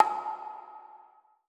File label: UI Button; godsoffortune